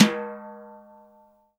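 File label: JAZZ KIT brush, Drums Acoustic; AKAI; 90s sample CD; USB Soundscan vol.10; JAZZKIT 3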